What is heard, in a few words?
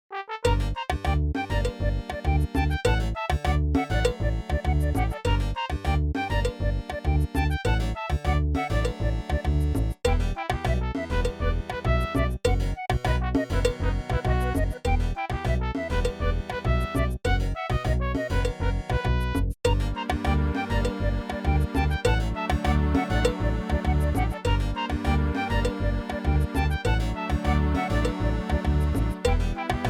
old runescape soundtrack; osrs